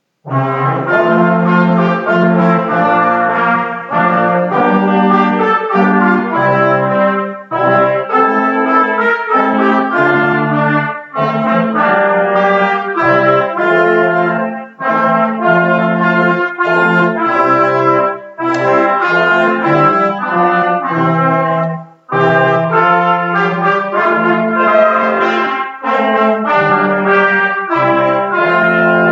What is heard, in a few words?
We Wish You Brass